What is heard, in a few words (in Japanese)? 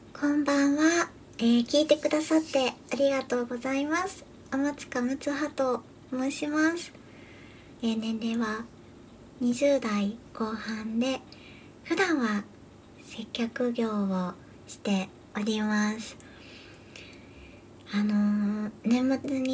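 大好きなクリトリスで大絶叫オナニーしちゃいます【ガチオナニー実演×連続イキ】; 20代後半元テーマパークキャスト; THE FIRST CALL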